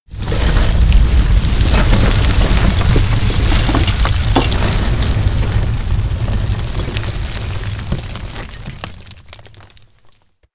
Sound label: landslide